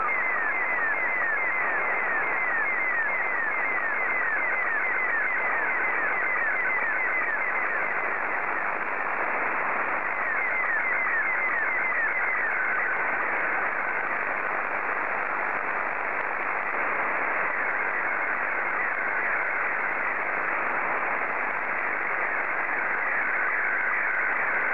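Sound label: SSTV, Scottie 2; JA3OEN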